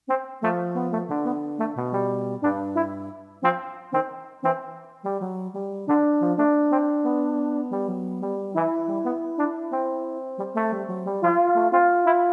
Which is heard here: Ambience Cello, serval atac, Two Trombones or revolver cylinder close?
Two Trombones